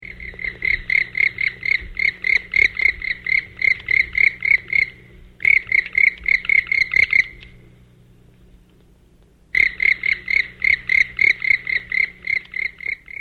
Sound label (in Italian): picchio nero; faunistico